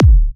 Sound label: Hard Kicks; Vengeance Samples